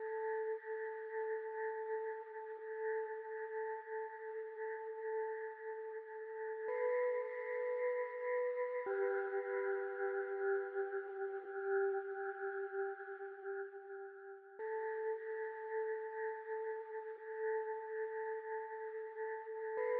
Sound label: lent sky; archibald editions